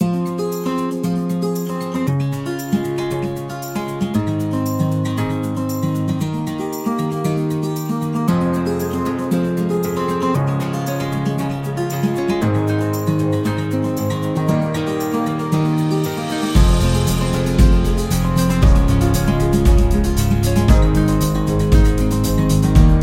Folk Acoustic; happy music vol 10

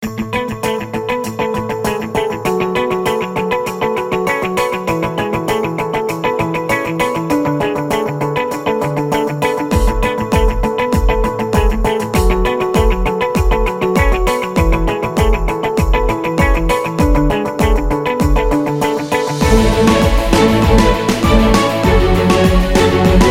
Happy Corporate; corporate music vol 5

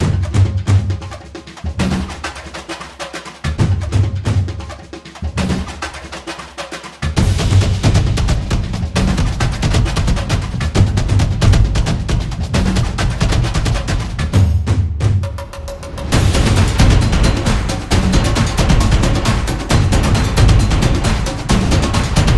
Sport Latin Drums

